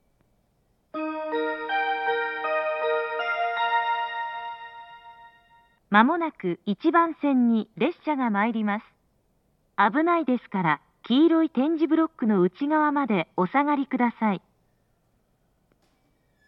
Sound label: numazu simple, station; jr-central